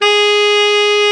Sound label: BRA TENOR, SAX; Best Service Gigapack 1; BR SAX 4